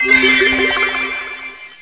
healing; humans; spells; war2

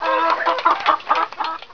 farm, humans buildings; war2

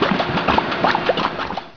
oil refinery; war2; humans buildings

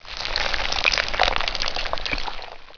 death and decay, spells; orcs; war2

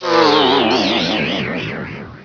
slow, spells; humans; war2

